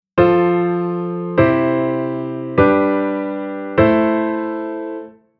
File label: Chord